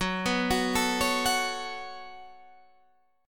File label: B 7th Suspended 2nd, F sharp